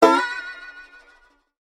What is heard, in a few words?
s20 ringtone, 갤럭시 S20 알림 음 벨소리